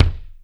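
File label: kick Muffled, Alesis D4; 300 Drum Machines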